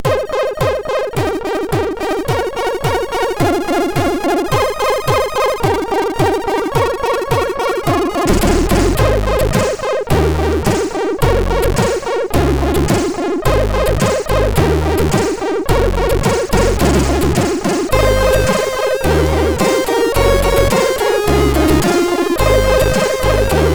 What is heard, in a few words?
Amiga, MOS6581R4 sid; Sphinx Amiga; SoedeSoft